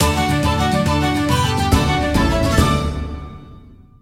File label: Short Hornpipe Fanfare; MathLand (gamerip) (2018)